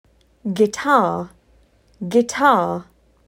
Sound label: Guitar